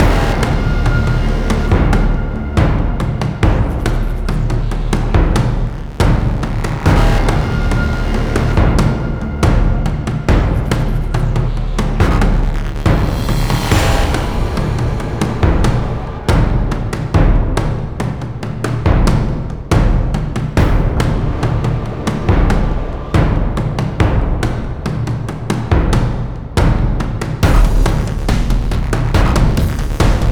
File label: Suspense